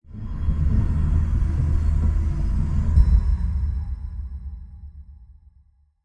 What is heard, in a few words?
right Hatch Door; Desert SFX; Desert Mech A58